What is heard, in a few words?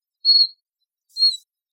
tristis Russia v India